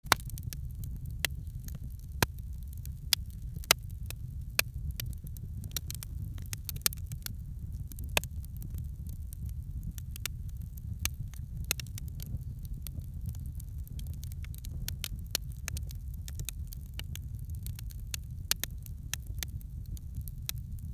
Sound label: campfire sound